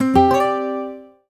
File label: Smart, notification; Honor; 600 Pro China